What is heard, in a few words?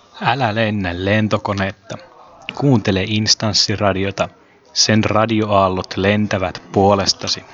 jingle; instanssi